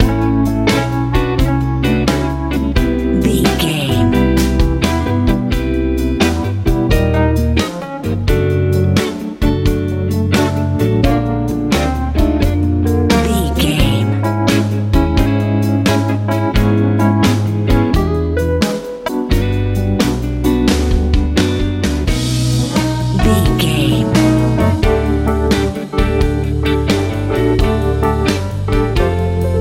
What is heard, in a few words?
soul rnb